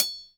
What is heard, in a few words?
hi-hat; Roland L-CDX-01